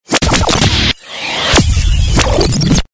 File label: Galery Noise; Sound Ideas - Galery Noise 002